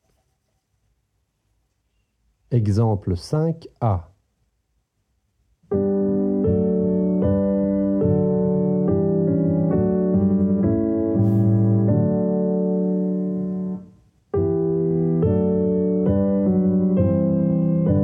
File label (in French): harmonie